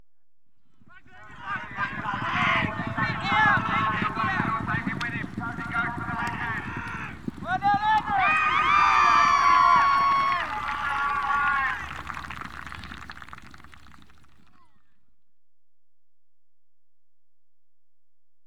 Polo, Goal Scored, Equestrian Events; BBC Sound Effects Library

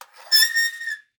Squeaks and Moans, Just Metal; SoundBits

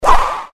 Fighting Swing Sound; FXHome

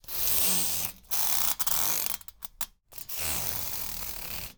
Plastic Comb to Plastic Object, Grate, Rub; LukasTvrdon; Design Source 192

